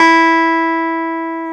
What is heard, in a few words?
KEY X Hohner Clavinet; Club-50 - Foundations Roland; Clavinets